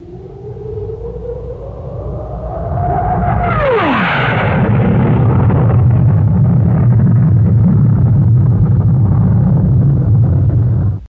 F86 Hot Pass By; sabre

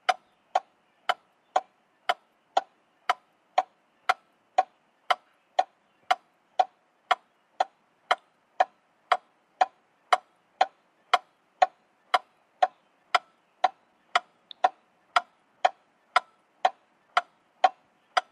Old Parlor Clock Tick

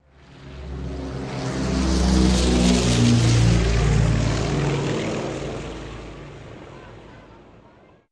Plane Twin Prop Otter

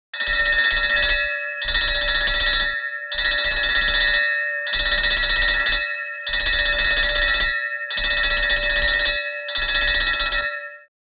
Bell Ambulance, bells

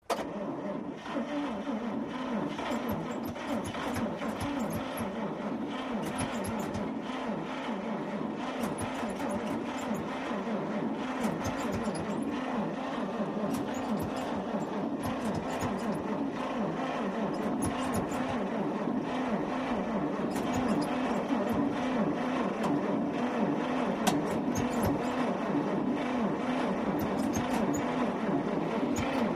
Fire Truck False Star

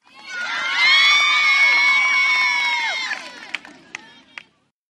Crowd Children Shout

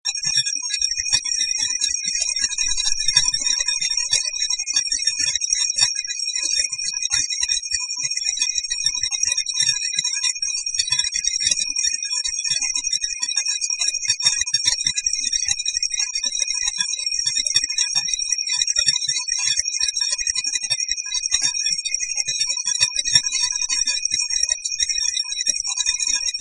Synth Data Processing

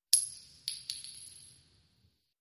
Shell Case Sngle Revb, shell casing movement

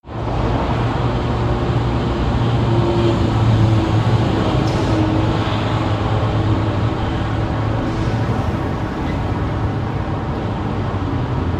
Downtown Traffic, big city

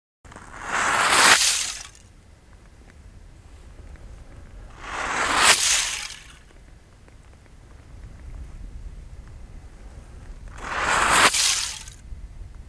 Ice Scrape Medium Siz

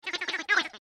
Weird Talking Device, cartoon gadget fx

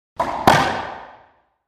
Racquetball Ball

